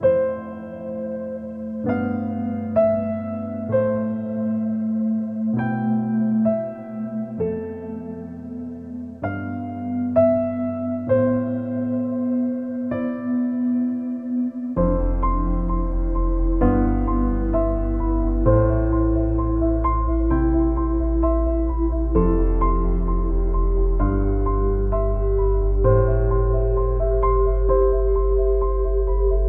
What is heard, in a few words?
piano, sad loop